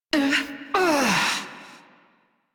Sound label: Struggle Long; New War